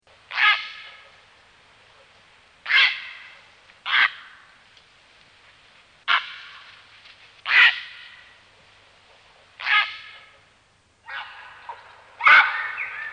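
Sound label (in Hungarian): Szürke gém; Madarak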